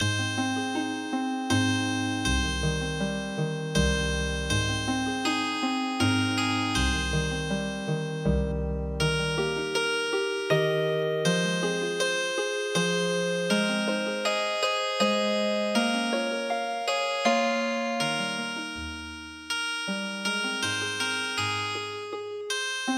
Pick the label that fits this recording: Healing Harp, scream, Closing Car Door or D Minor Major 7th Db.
Healing Harp